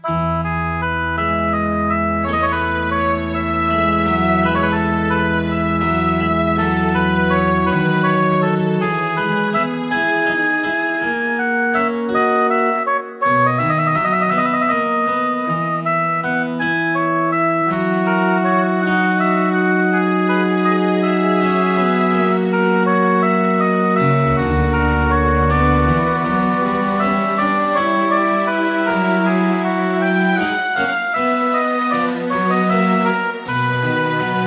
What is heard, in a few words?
Prelude in E Major Orchestral Version; Bach; BWV854A